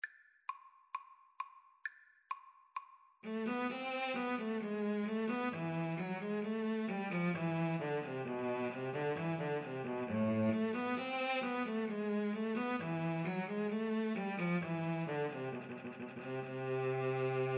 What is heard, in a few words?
Cello Duet